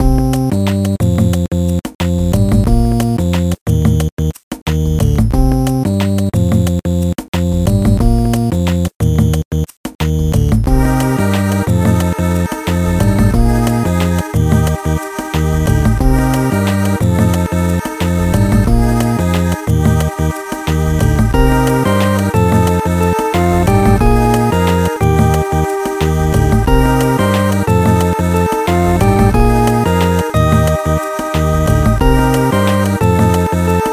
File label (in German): Musik Beach